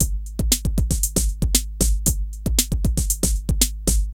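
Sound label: drum loops, right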